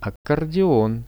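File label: accordion; аккордеон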